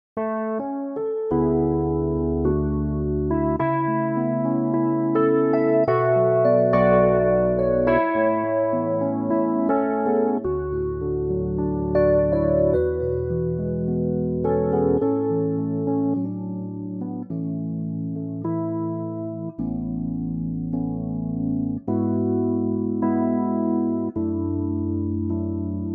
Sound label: A Arturia EP